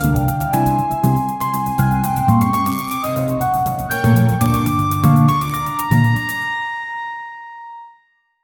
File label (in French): musique drle et mystrieus, quirky